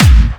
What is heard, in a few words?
Clubby Kicks, Bassdrums